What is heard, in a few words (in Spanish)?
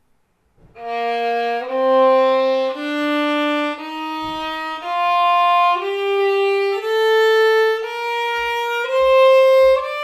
si b escala; mi primer libro de escalas